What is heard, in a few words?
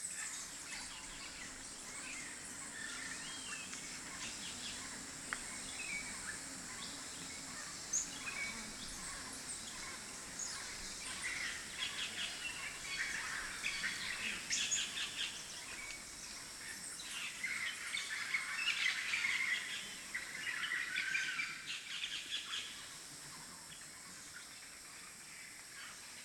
ambience sfx wide; silverback